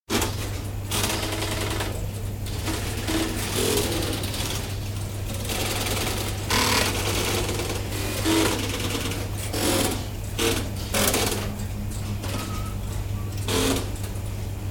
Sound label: BT Strowger electromechanical exchange; 1922 onward; Miscellaneous Office; BBC